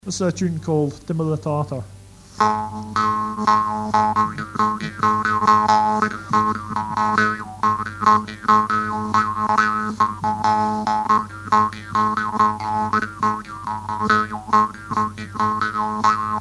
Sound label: jews harp